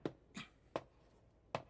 Walks, Percs

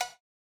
cowbell

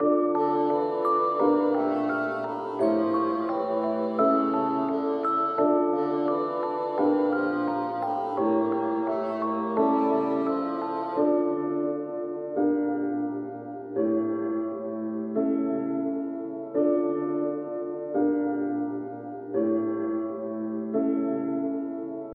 type melodie

